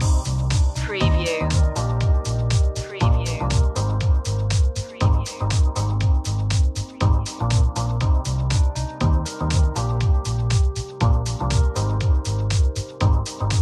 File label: night waves loop